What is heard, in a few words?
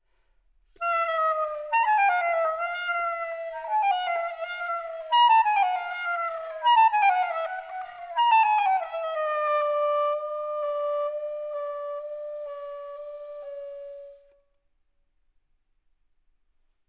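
Sopranino Sax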